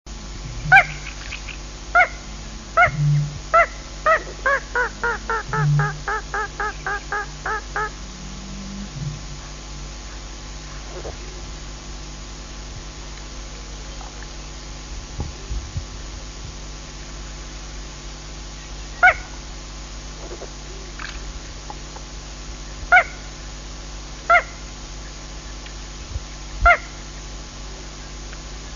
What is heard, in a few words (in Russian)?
malyy pogonysh golos